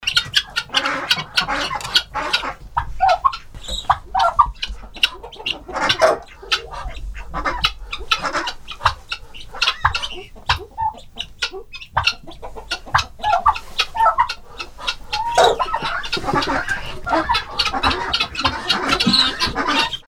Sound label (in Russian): kriki cesarok